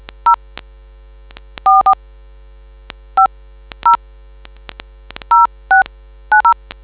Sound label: dtmf